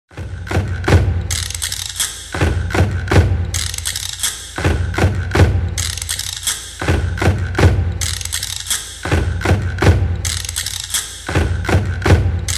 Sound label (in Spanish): Percusion